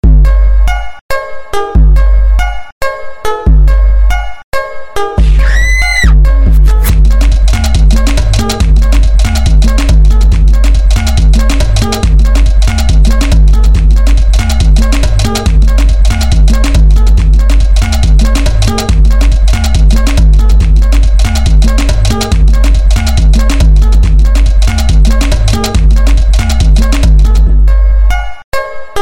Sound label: batida pura